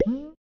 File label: Slime Vox Big